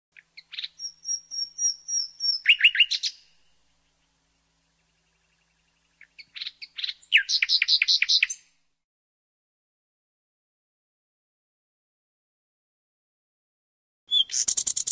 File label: nature nightingale set